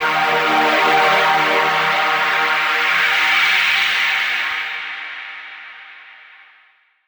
LONG FX; Loopmasters; Keith Mackenzie & Fixx Present Booty Breaks Vol.2

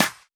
Claps & Snares

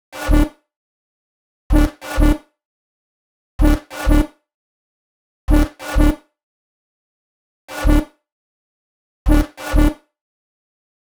127 G Bass, Complex Electro Synths; Prime Loops; Adversity

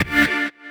D STAB, Funky Underground; Loopmasters; Funky Underground Vol 2

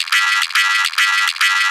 140 FX LOOPS

